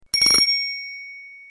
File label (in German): Fahrradklingel; Rocky